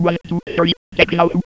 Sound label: 123 techno